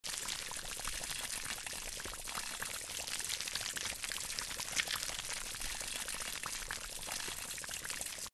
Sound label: Ink Vein Loop